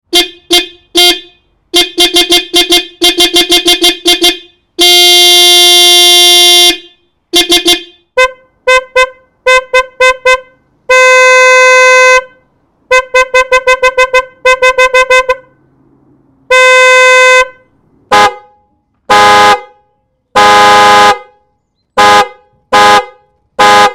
Car Horn 2, Car hooters - various beeps - 3 different cars; Tom Hutchings